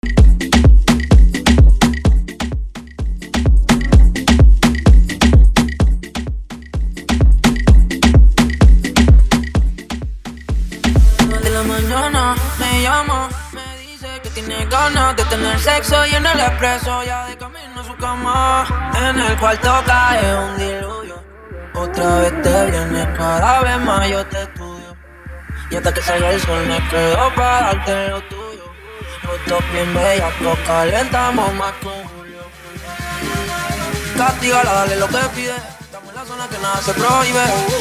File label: afro house 128bpm; remixer from Nicaragua